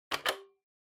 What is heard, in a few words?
Flip Clock Button Off